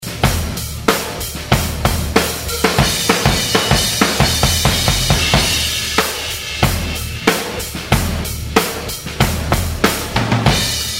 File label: Drum outtakes